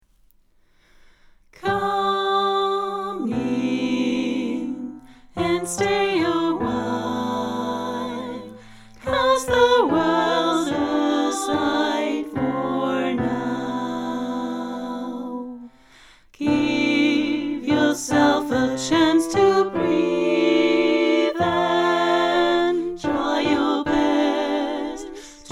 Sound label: Alt come in; Singalongs